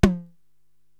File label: TOM High, drum machines